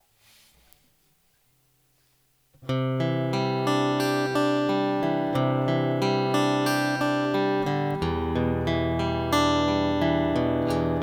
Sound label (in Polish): gitara akustyczna taylor; test kabli